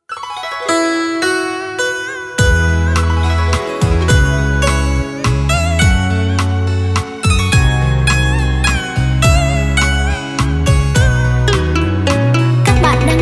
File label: beat, playback